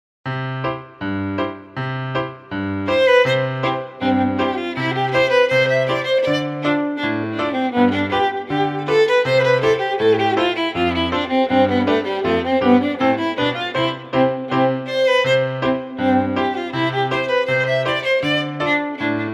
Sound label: Fiddle Tunes Traditional; Aus der musikalischen Schatzkiste 1 - Viola